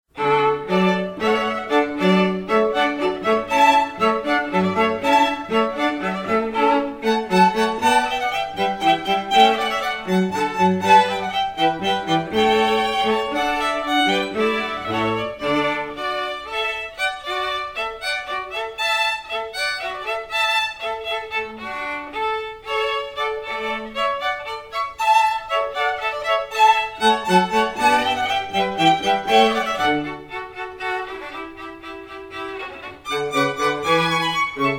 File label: Hornpipe from Water Music, strings